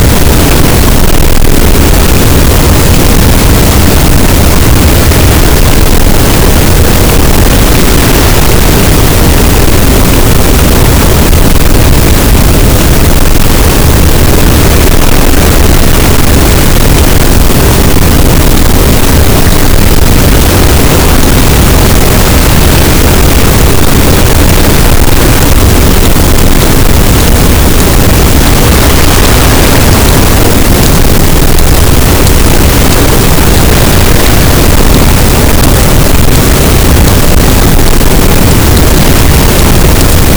cachalot, Physeter Macrocephalus, gaussian noise

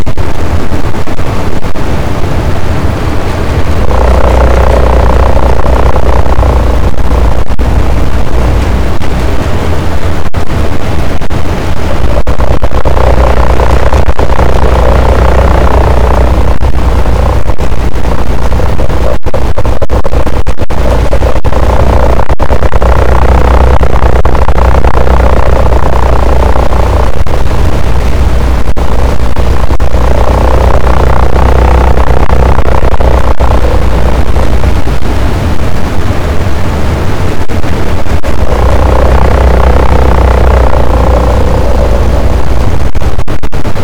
cachalot, boat; Physeter Macrocephalus

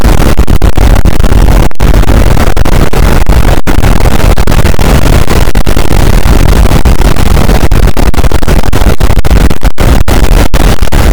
cachalot negatif, boat, Physeter Macrocephalus

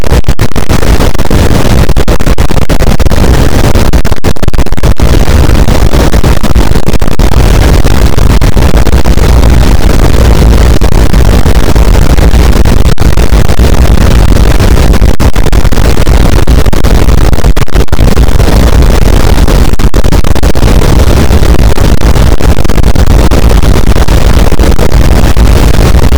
cachalot negatif, boat, Physeter Macrocephalus

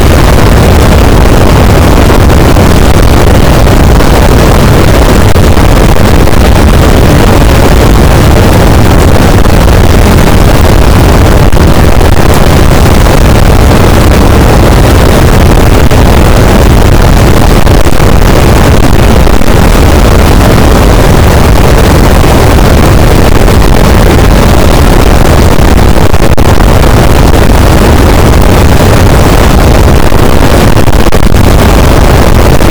cachalot positif, boat; Physeter Macrocephalus

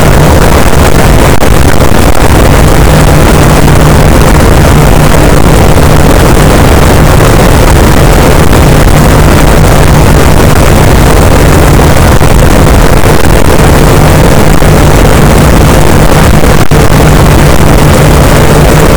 cachalot negatif, boat, Physeter Macrocephalus

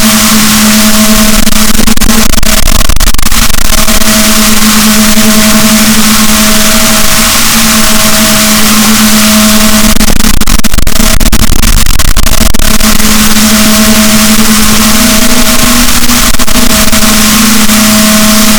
rorquals positif, BalaenopteraPhysalus, boat

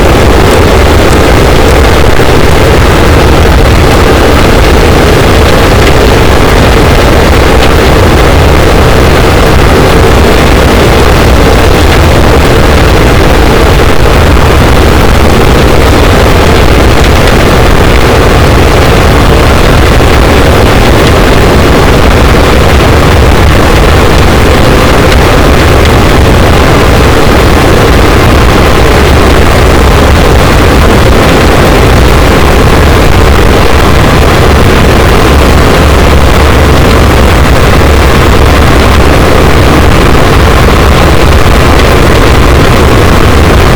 cachalot positif, boat, Physeter Macrocephalus

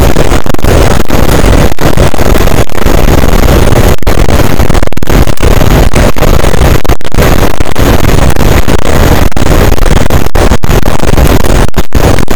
cachalot positif, boat; Physeter Macrocephalus

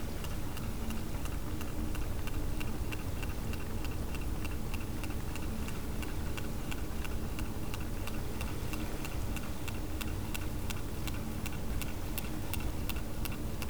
cachalot positif, Physeter Macrocephalus

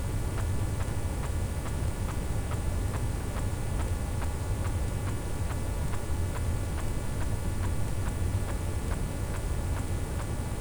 cachalot negatif, Physeter Macrocephalus

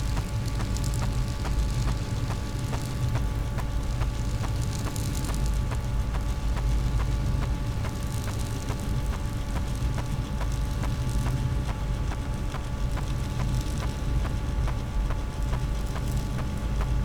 cachalot negatif, Physeter Macrocephalus

